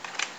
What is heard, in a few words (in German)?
D5000 leise